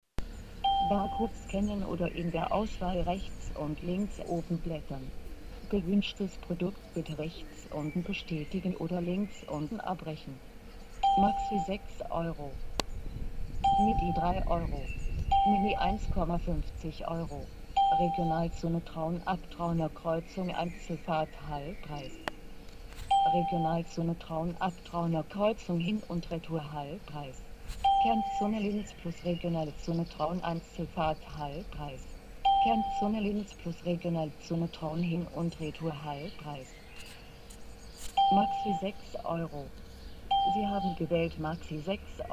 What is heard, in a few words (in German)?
Fahrscheinautomat; Ennsfeld